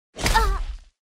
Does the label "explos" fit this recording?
No